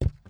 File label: footstep wood; Don't Be Afraid The First Toy